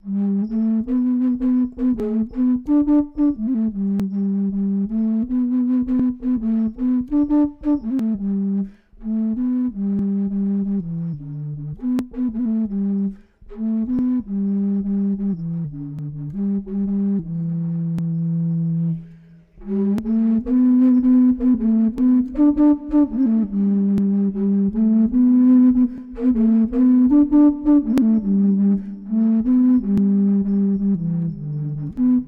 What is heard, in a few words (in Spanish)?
Pinkuyos